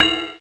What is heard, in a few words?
Casio SA-10 Ride